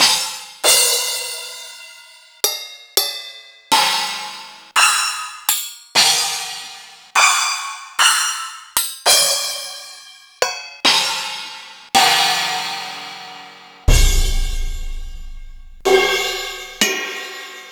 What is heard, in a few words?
Millenium MPS-4000